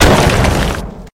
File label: hook land